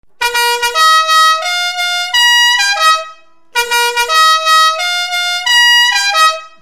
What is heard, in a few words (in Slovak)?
fanfary 12v music la marseillaise; fiamm